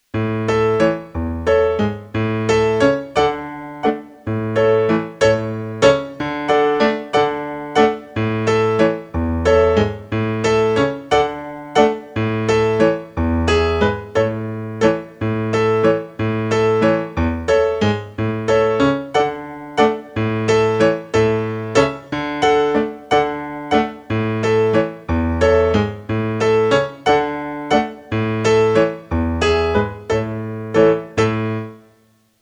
Syncopated Rhythm Accompaniment with Chromatic Bass line